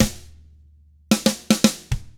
SMBA, SAMBA; ILIO; Double Platinum Drums 1

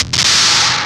BACKFIRE, Impacts, Hits & Booms; Distored Reality

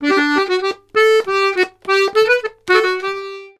Accordions, BOUREE; USB Soundscan vol.40; AKAI

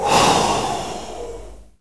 WHISPER OO; Spectrasonics; Heart of Africa 1; WHISPERS

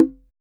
TRBCGA MUTE, congas; Drums In Your FACE!

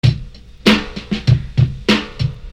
Beat, Hip-Hop Street Beats

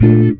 GTR CHORD; Univers Sons; Jazzistic

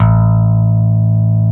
SLAM BASS A2; Drums In Your FACE!

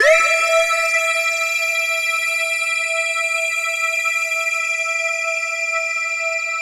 Synth Strings; Club Joints and Street Anthems

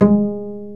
PIZZ BASS, Pizz Double Bass

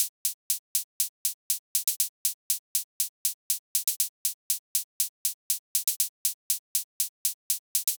Closed Hihat 120bpm, Nu Disco; Zero-G; Construction Kits; Legacy

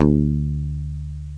ELECTRC BASS; OMI - Universe of Sounds

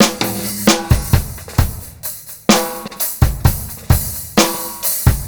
beat, Roots Funk; USB Soundscan vol.41; AKAI

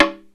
AFRICAN INST; OMI - Universe of Sounds

